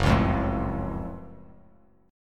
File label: F sharp Minor Major 7th F sharp; strummed